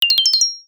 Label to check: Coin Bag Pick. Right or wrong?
Right